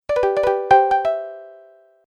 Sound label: Message Alert Sound, musical